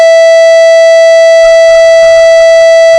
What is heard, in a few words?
tone